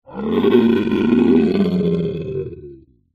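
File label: animal dinosaur vocalisation growl; zapsplat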